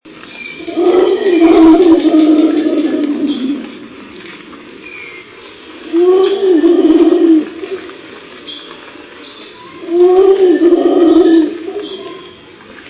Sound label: Howler Monkey